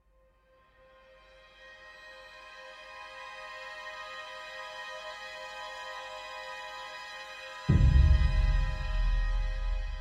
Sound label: Ambient Idea Nonloop